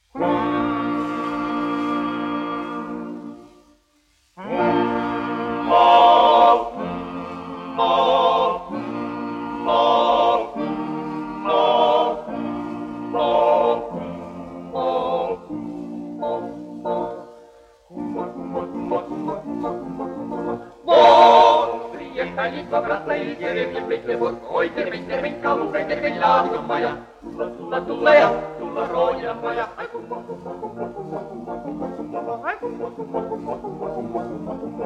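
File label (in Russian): мужской вокальный квартет, krievu tautas dziesma; harmonika; Латвийской Национальной библиотеки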